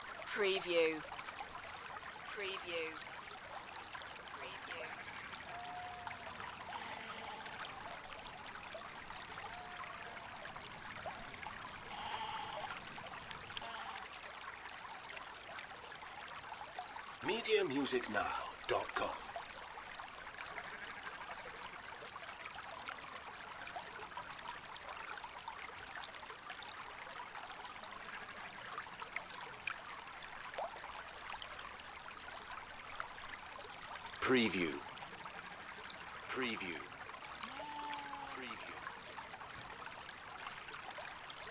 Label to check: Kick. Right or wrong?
Wrong